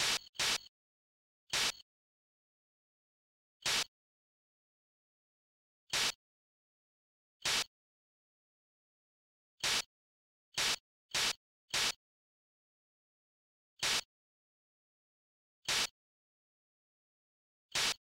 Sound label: glitch